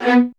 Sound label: LDVLN ATT B3, LOUD VIOLINS; Q Up Arts; Denny Jaeger Master Violin Library